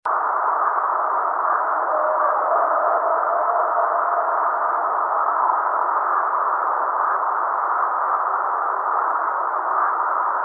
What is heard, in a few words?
whoop, bigfoot